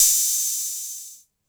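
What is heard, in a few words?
606 open hat accent; tr-606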